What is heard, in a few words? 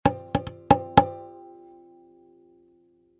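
sounds of violin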